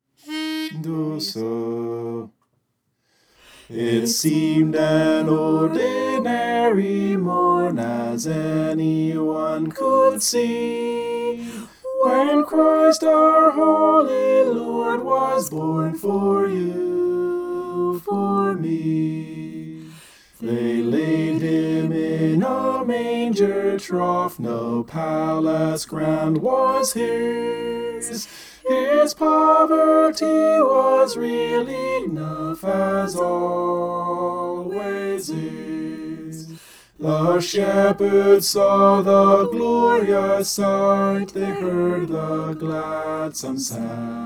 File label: soprano